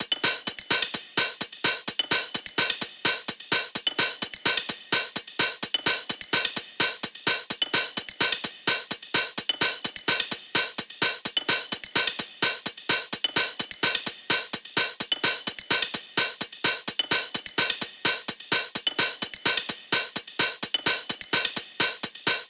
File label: tops 128, Top Loops; Drum Sample Pack; SD SEISMIC; Slate